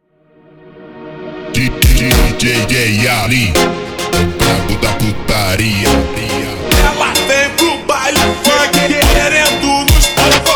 Montagem super Slowed

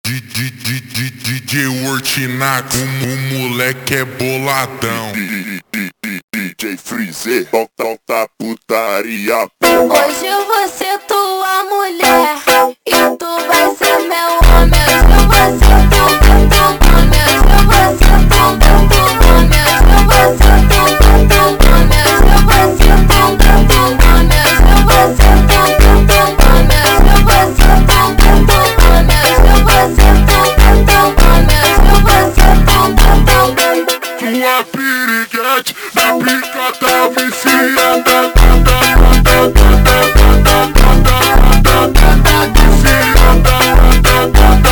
Montagem ultra Slowed

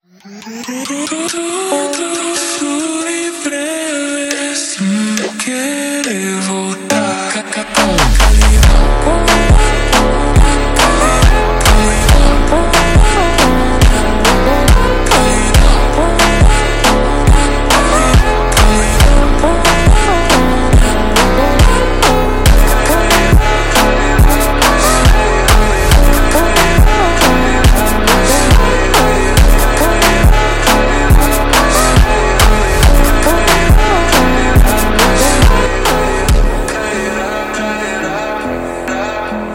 Montagem super Slowed

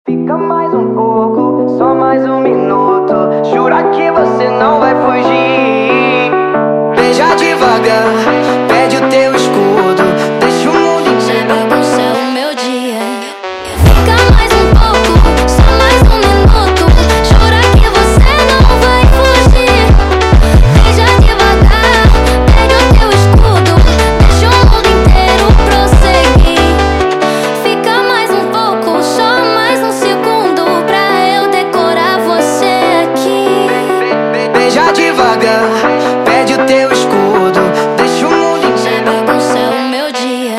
Montagem sped Up

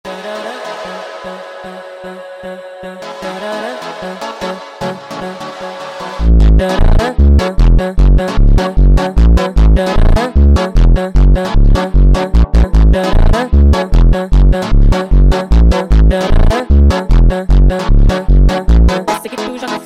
Funk Sped Up

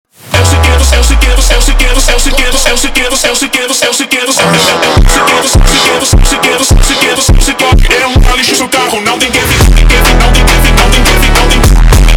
Funk slowed

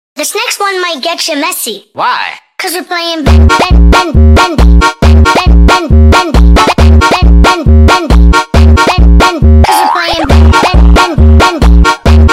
Bendy Funk sped Up

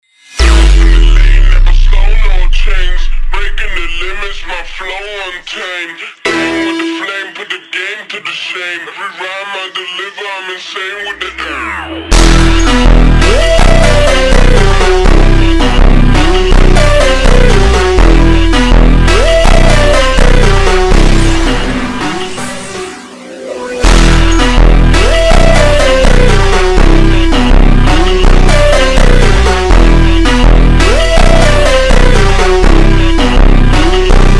Montagem ultra Slowed

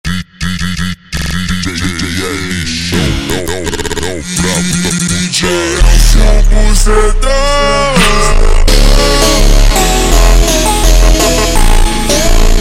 Montagem ultra Slowed

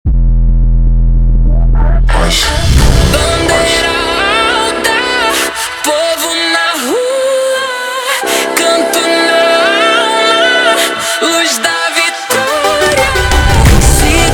Funk slowed slowed

